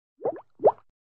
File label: Bubble; make a hat for elmo online windows gamerip 2006